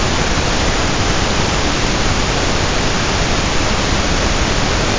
noise pink

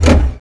gear up; crc2005

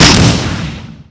flak 88 fire, weapons; Spearhead Demo

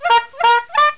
HONK; IGNITION; BOOST

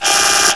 bzzz; MOBICLIC; sciences

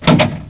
3RD GEAR; mtm2demo

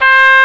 dulcian high

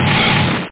ship fire; SecalDemo; Silly; 8,363 sample rate

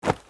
land; Jungle Reporter

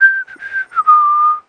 WELDER WHISTLE; Messiah

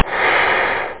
breath in; Epic Collection 3, The; Epic Marketing; muzak

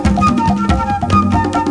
RHYTH, LOOPS

